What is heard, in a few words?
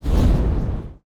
spell fire throw; player